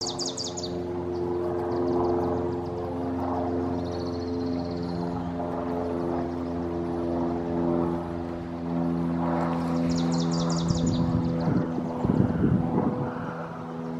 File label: Wilson's Warbler